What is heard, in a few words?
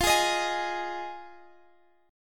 F Minor 7th F Guitar Drop D